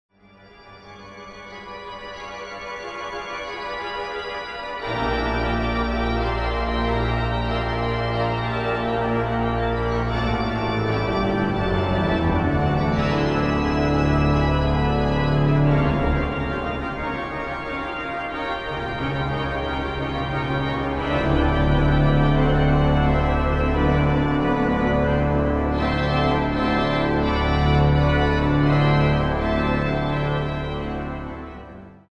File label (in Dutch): orgel; Kerst met TMMF en Lucas Kramer